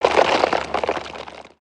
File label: Siege Tower Debris; destructibles